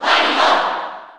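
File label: Cheer Japanese; Wario; SSB4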